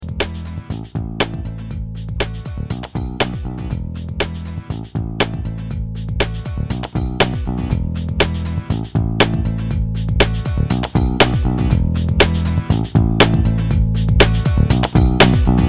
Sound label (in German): einfach lauter machen